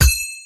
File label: cymbal C